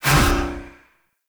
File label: Rifle Reload; Weapons; Warframe; Zariman